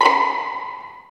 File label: Vlns Pizz; Roland L-CD702; Vls Pizz